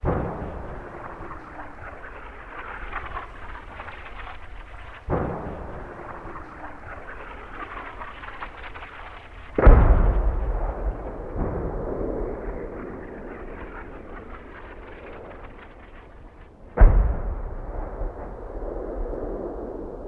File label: Amb Destruction; Red Orchestra Ostfront 41-45